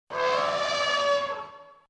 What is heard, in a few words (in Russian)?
korotkij slonovij krik